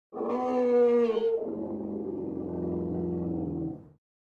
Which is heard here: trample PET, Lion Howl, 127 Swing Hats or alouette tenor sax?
Lion Howl